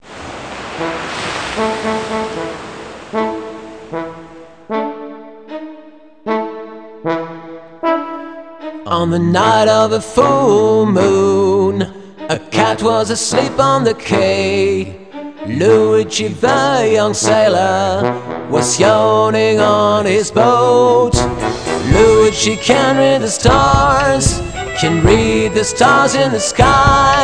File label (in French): Chanson; MOBICLIC63; TCHATCHE